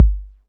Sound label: bass drum g key, Royality free steel kick drum tuned to the G note. Loudest frequency: 107Hz